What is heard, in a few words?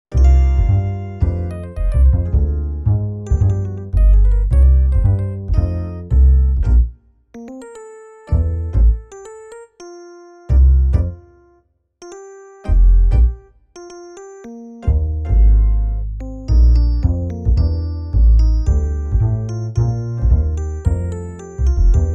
e piano bass